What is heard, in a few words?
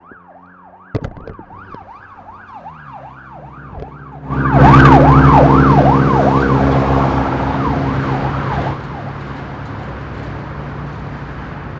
Police Siren Drive By